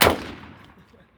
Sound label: Shotgun Shoot